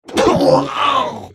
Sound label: spellcast effort